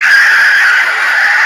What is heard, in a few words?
jumpscare; pirate percy